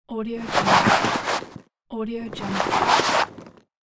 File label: leatherworking